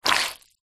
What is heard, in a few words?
foley wet clothing squelch; zapsplat